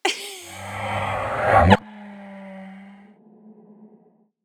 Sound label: 124 vox